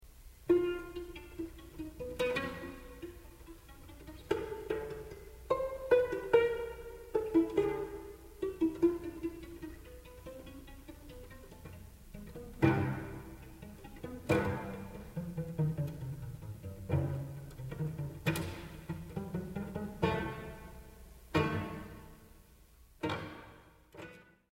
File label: Cello Suite 3 Allegro e sempre pizzicato